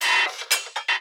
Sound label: mechanical